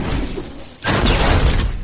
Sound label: lighting